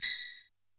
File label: Jingle Bell, Standard Kit, Yamaha PSR-E323